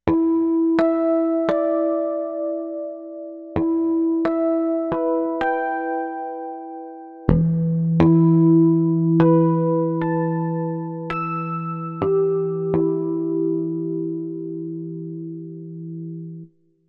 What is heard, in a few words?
Harmonics